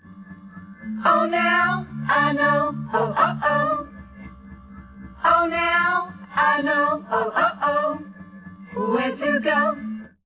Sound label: nodrums 130ms 4cm, underdetermined; SiSEC11